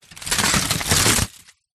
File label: household tin foil sheet rip